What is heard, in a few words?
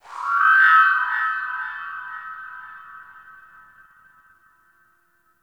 Sentient Texture Medium, Ambience; Sentient Lair